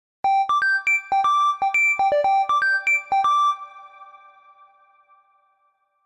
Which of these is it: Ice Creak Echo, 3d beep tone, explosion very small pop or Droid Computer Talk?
3d beep tone